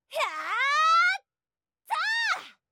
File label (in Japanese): 攻撃